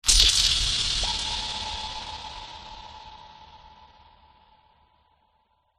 cinematic hit bright electric jumpscare with whining tail; sound design